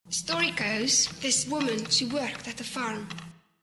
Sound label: reverb